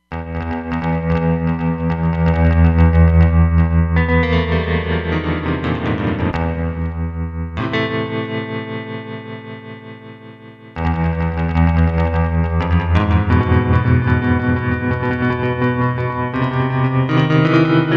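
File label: Surf Guitar, Roland Fantom XR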